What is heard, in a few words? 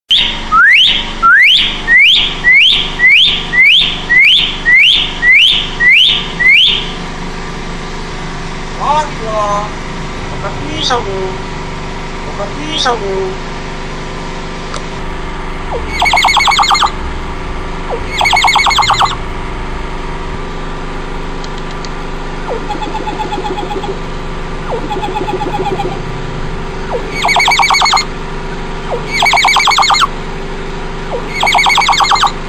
Nias hill myna